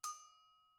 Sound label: agogo Bell mp, Struck Idiophones; Agogo Bells